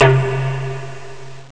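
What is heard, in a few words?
flute low; Amiga Soundtracker Sample Packs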